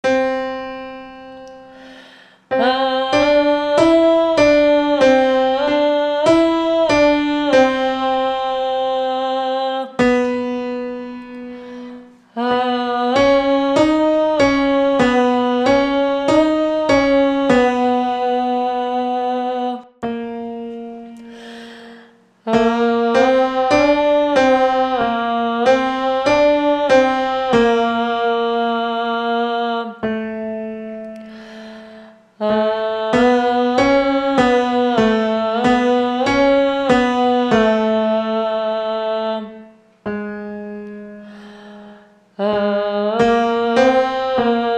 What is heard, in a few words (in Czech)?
plny klavir a hlas